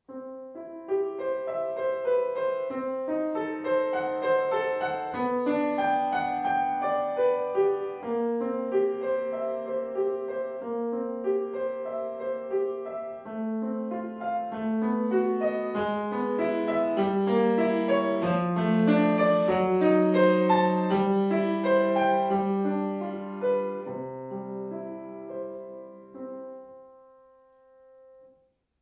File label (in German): Leichtes Klavierstueck; Hummel